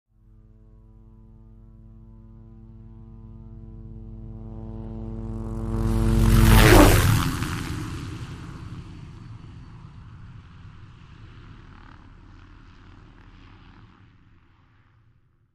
Hughes 500 Single By M

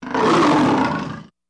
Lion Growl Angry Gene; big cats

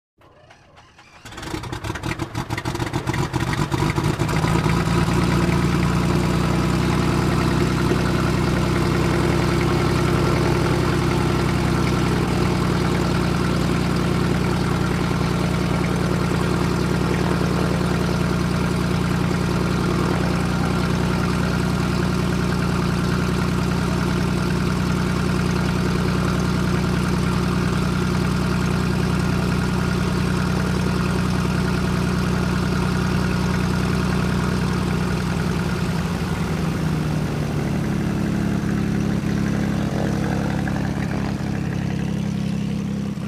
Piper Arrow Prop Plane Start And Idle Close.

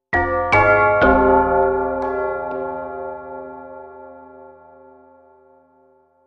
Clock Bell Logo 2, stinger

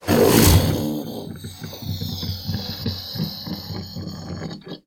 Alligator Snort Brea

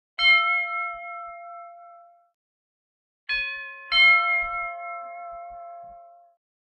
Doorbell; Household Doorbell

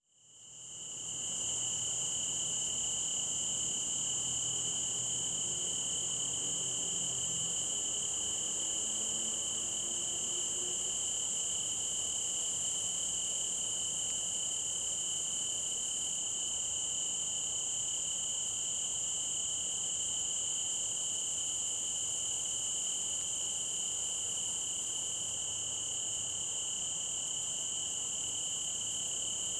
Multi Cicadas Medium

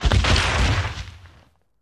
Dragon Falls, dinosaurs

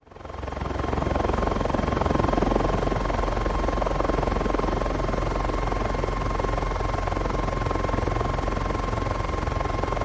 OH6 Loach Exterior, little bird

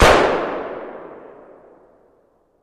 Gun Shot Sngl Ext

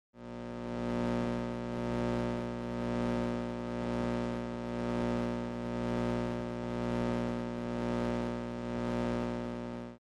Elect Hum Buzz; buzz & hums

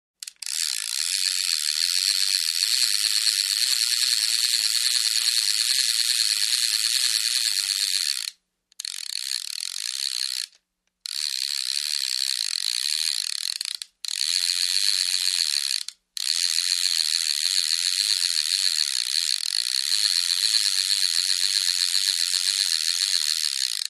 Fish Reel Crank Reel, fishing

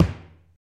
Foot Slam Wood Floor

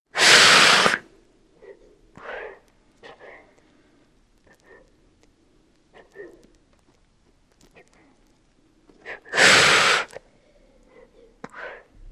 Lizard Beaded Breath

